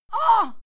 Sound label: female fight scream pain